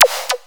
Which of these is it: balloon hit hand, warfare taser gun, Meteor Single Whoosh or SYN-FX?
SYN-FX